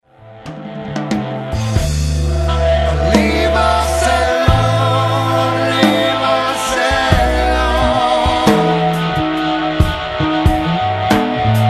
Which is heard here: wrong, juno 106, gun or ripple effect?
juno 106